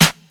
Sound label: nice snare single hit E key, snare tuned to the E note, Loudest frequency: 3238Hz